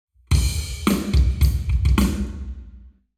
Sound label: Hall Drums 0 deg